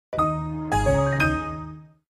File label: Samsung logo sound